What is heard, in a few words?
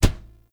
Unarmed Punch Impact Metal; Weapon Impacts; Player; Environment